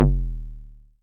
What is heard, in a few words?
bass stabs, Drum Machines FutureRetro FR777